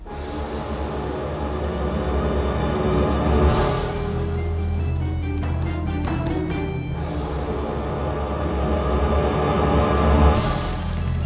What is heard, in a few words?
Boss Loop; Gods Remastered